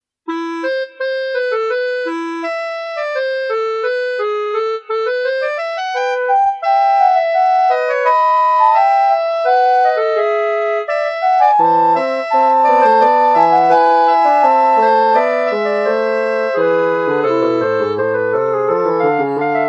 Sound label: Fugue In A Minor